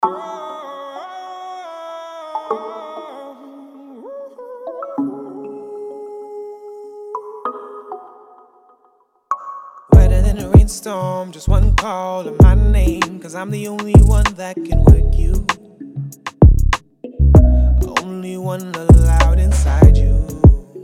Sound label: Rain Storm